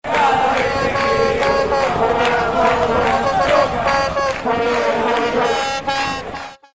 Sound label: vuvuzelas and supporters